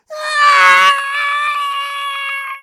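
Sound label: scream squeezed; Ice Age Scrat's Nutty Adventure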